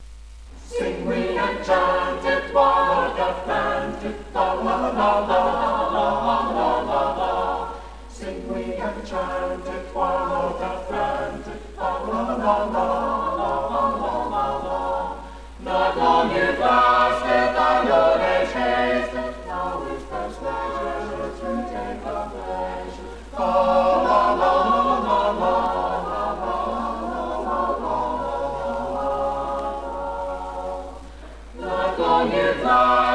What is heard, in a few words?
chorus